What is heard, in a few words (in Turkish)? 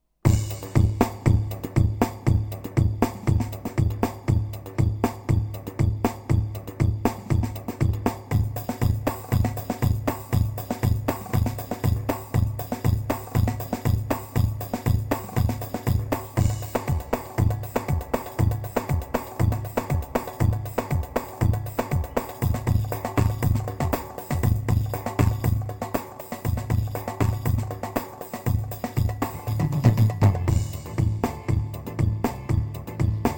pa 500 tr semah, 2 4 ritim